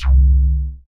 WET BASS; Masterbits; Dance Trax

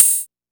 KORG 01W KIT; Rubber Chicken Software Sample Bank

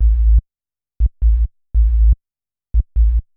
SUB BASS DR, Techno 138 BPM; Best Service ProSamples vol.54; AKAI

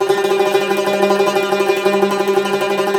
CUMBUS TR, Cumbus Tremolo

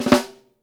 RUFF, SNARES; Sampleheads - New York City Drumworks VOL-1; SW SNARES

